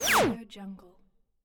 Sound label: scifi bullet flyby; stormwaveaudio